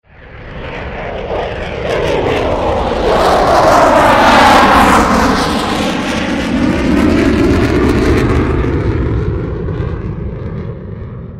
f16 passage, Aircraft sound record

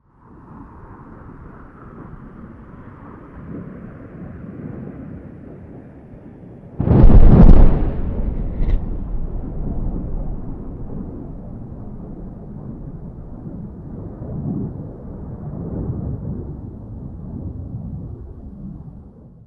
Mirage2000 bombardment, Aircraft sound

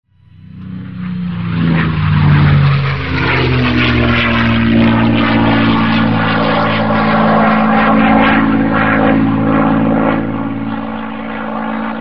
p51 passing, Aircraft sound record

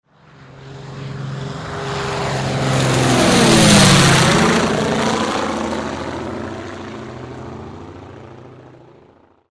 beech passing, Aircraft sound record

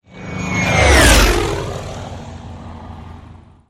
hellcat

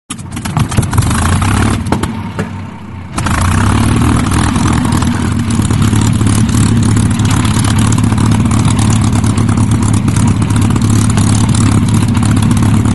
p51 mustang start

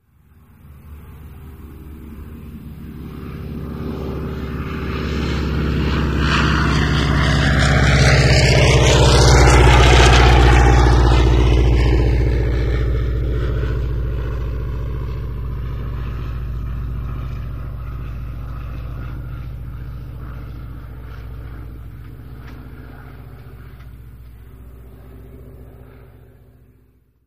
stearm passage, Aircraft sound record

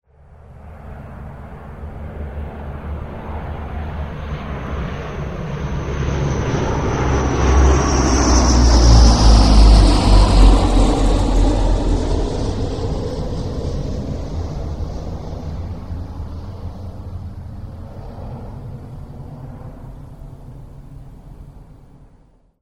transall, Aircraft sound record

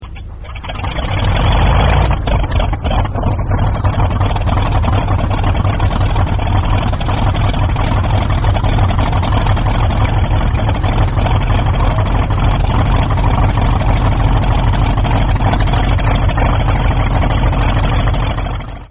corsair start

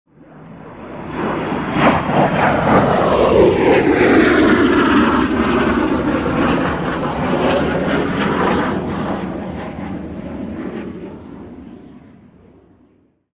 f117 passing

